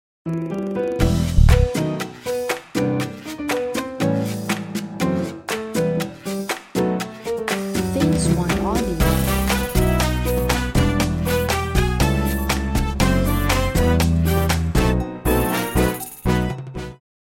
Song Latin Jazz

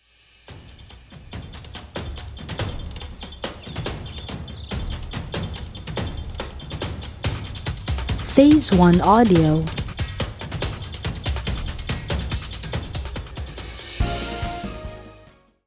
Jingle Racing Retro

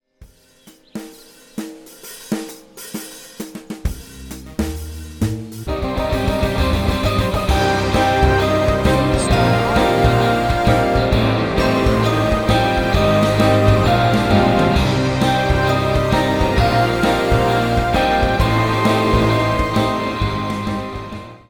Jingle Journey

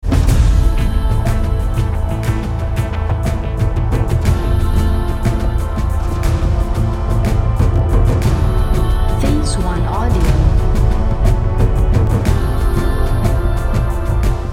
Jingle Tense

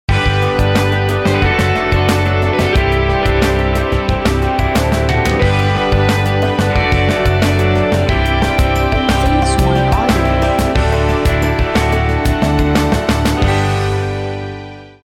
Song Light Rock

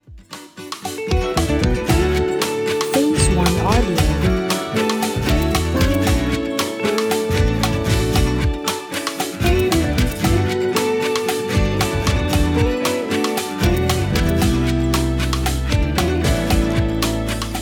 Song Acoustic

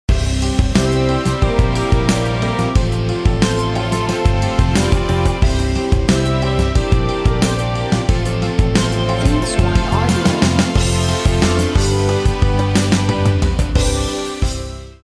Jingle Product Intro

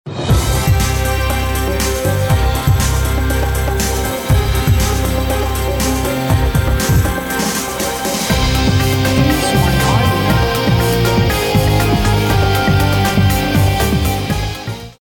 Jingle Product Intro

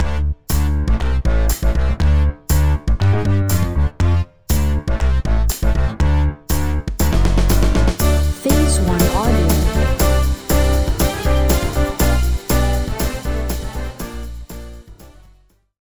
Song 80s Comedy

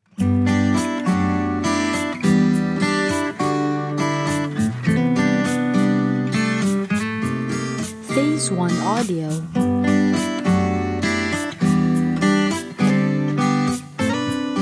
Song PoP Acoustic

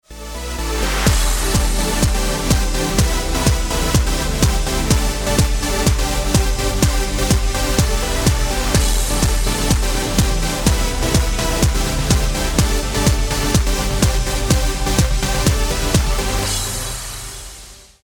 EDM Style

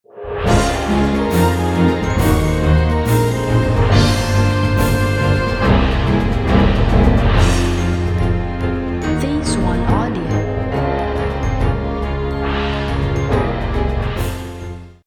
Jingle Journey

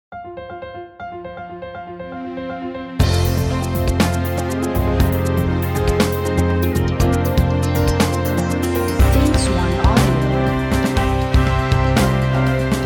Jingle Company

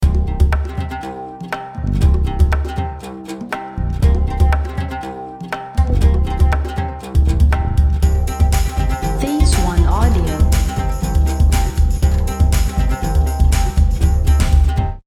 Jingle Percussion

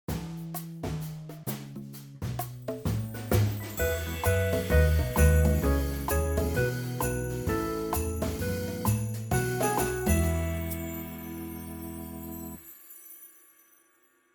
Christmas Style

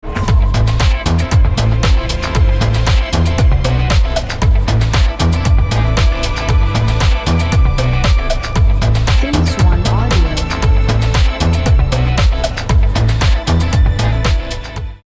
Song Funky

